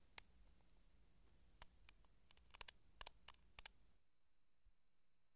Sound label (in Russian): zvuki gubnoi pomady